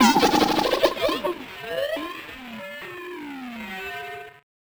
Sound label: Malfo Syn, malfunctioning synth